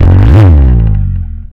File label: FUZZ SL, Bass Legends, SLIDES; Spectrasonics; ALL SLIDES